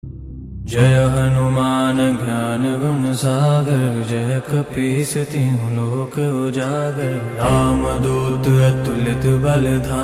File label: hanuman chalisa ringtone